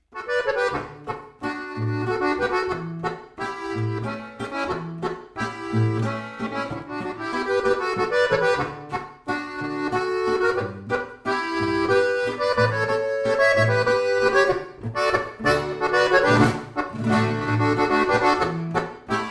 volksmusikstueckl

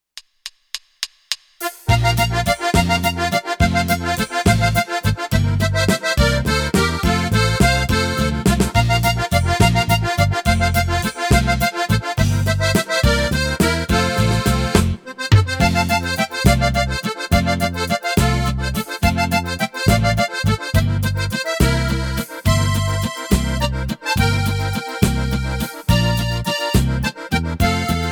Aulaendler mit Melodie

